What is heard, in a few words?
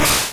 Scratch